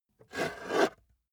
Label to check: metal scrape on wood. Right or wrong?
Right